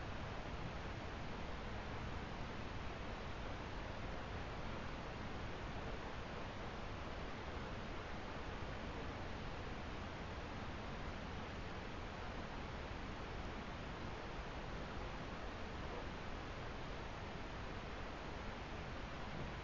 Quiet quiet ocean

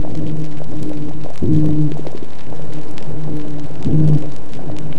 tonal amplified LPfilter500 toadfish

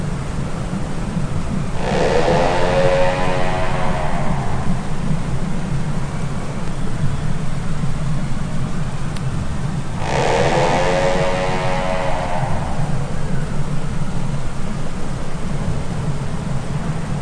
moan crabeater seal

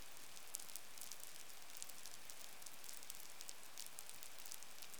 Rain rain

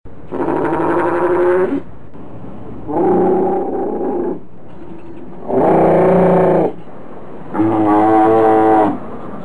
Four Calls northern fur seal

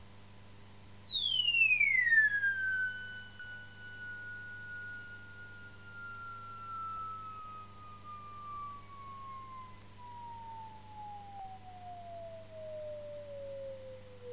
Trill weddell seal

